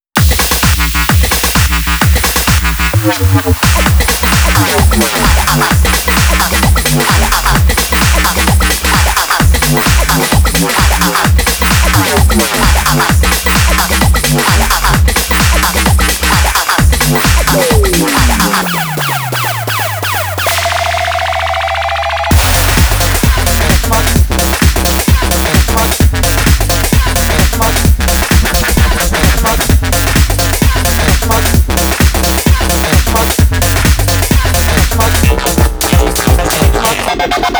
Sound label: Fidget House; BHK Samples